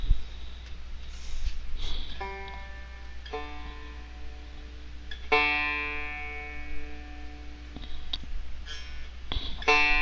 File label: fender, banjo